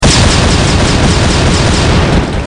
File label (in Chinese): 打斗-连续爆炸